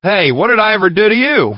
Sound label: shot